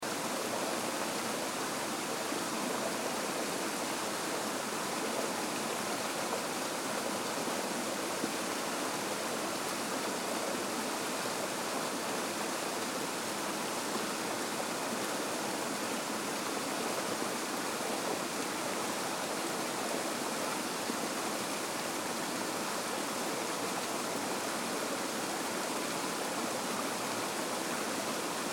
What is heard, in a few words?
Derby Brook; Jay Mountain Wilderness 2014